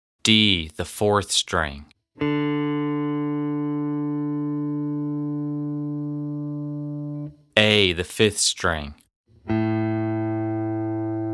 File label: Open Strings